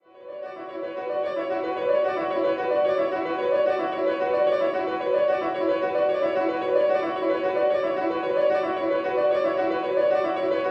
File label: Piano Phase